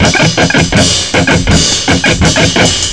Riff; PlayRiffs 1.0.3

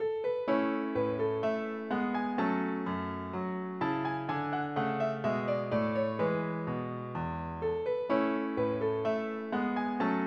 gavotte; 3800 MIDI Magic; classics

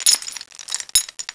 chain Rattle

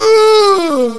uuh; Alienisti

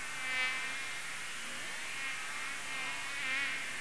mosquito; Image Bonanza; Expert Software; animal

